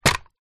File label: leisure toy truck plastic rubber wheels down on ground